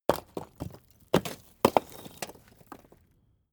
stone large fall; natur-tiere; steine

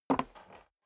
step boots wood creak single right; Footsteps; schritte

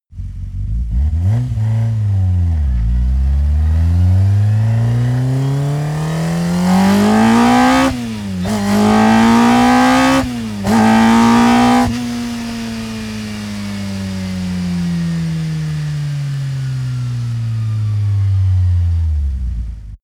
car vw polo fast acceleration deceleration stop

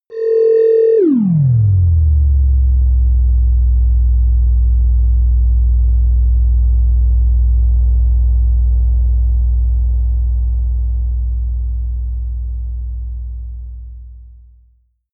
protective shield shut down; energie

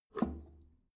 drain valve close regular, badezimmer; haus garten

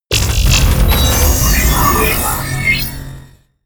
sci-fi collect loot heavy; hits impacts

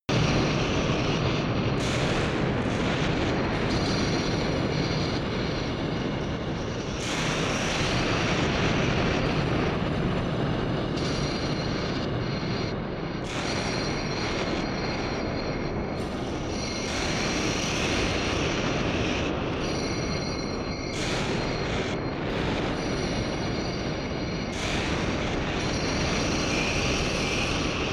underworld disaster, horror

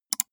gaming mouse left click single fast; maschinen; buero

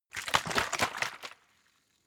bottle water shake short; berufe

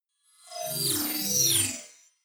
swell short metal string computer

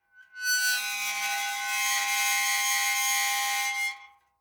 metal squeal short high tonal; industrie-wirtschaft

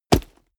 combat cloth punch; krieg-waffen; nahkampf; soundpool

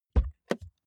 car bmw e46 interior door front open; Cars

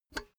cash box metal lid open; maschinen; soundpool

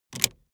car seatbelt unfasten hard

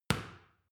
boxing paw hit medium; sport freizeit; boxen

